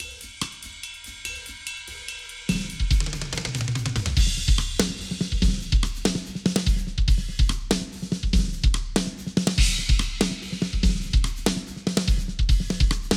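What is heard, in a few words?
yamaha 9000 recording, drum; klangmacht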